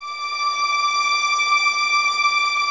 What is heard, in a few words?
violas D 6, String Ensembles; Miroslav Vitous; VLS 1+2